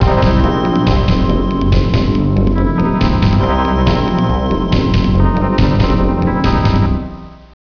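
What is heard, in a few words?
sinister bass loop; Inside Mac Games; Total D Demo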